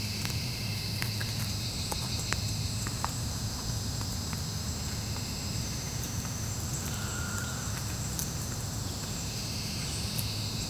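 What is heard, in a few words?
deep inside a lush tropical, rainforest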